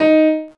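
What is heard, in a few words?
voice piano; gamerip; PS3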